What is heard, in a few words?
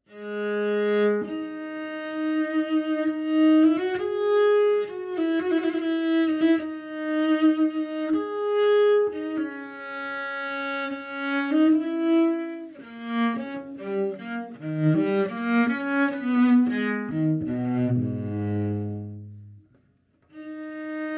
Contrabass; Assassin's Creed Syndicate